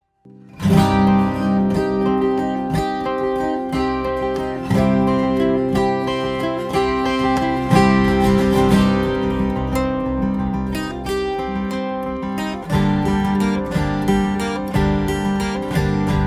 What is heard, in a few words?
Theme on a 12 String Guitar